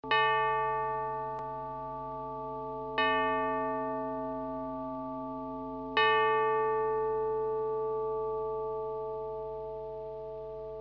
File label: bell sound